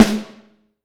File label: Snare Drum; Q Up Arts; Johnny.C's Vintage Snare Drum; 5.5X14